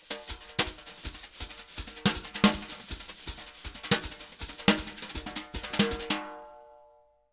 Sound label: Jazz Latin Drumloops; USB Soundscan vol.08; AKAI